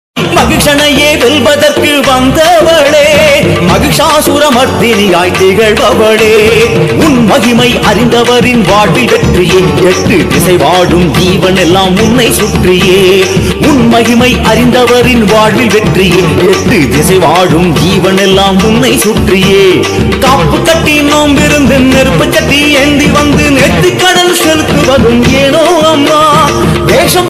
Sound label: tamil devotional ringtones amman devotional ringtone